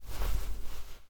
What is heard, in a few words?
rustling of clothes